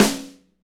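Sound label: snare, Motown; Northstar; Drumscapes Roland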